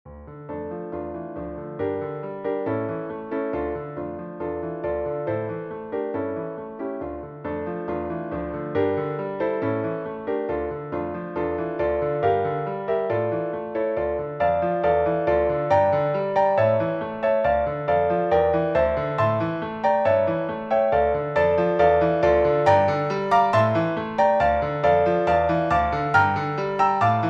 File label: The Tiger, Piano Solo